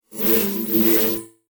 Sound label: Static Blast Data Transmission Radio Tuning; Sound Design; BBFX